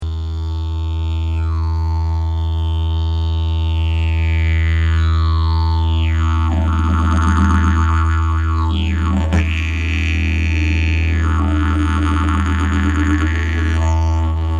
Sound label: didge